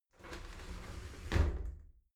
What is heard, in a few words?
shower slide doors close soft; objekte; tueren